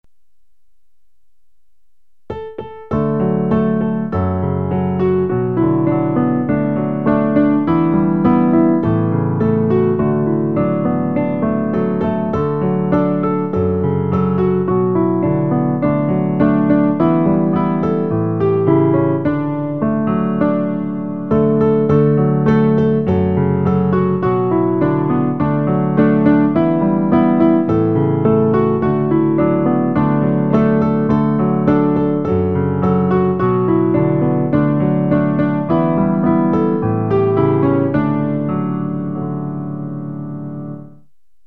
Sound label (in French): piano; Je Te chante une mélodie; Pour accompagner le recueil Louez Dieu.